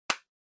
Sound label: Hand Foley Clap Hard